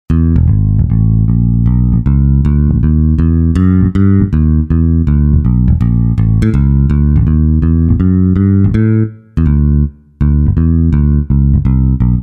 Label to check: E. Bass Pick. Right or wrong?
Right